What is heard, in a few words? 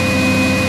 P42 turbo